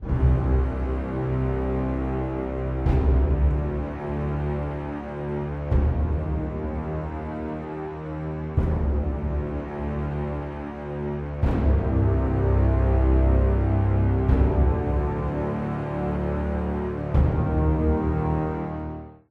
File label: Dark Epic; Hellsplit Arena